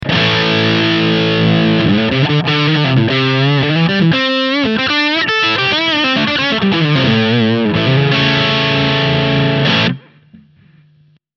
British Crunch

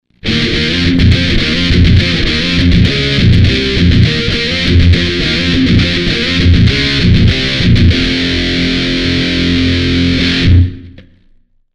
modern lead boost

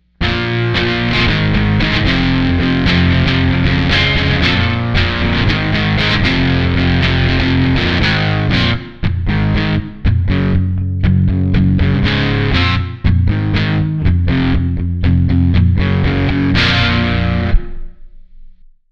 classic crunch

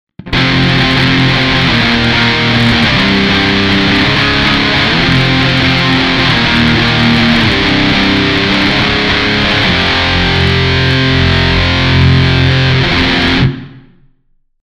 modern crunch boost

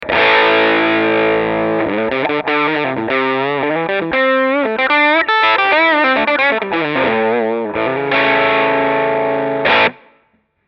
Vox Crunch

